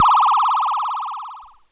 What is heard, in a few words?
Wibble, Synth FX, cartoon; musicradar; cartoon caper